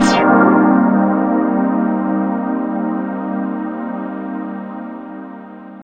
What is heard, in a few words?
Eb, Drum & Bass Pads; Total Drum&Bass; 90 s Sample CDs